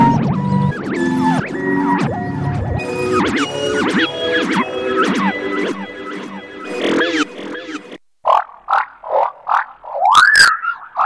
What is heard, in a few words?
SYNTH SFX; Motif